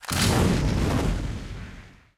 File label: bonus; Alawar; Crusaders Of Space 2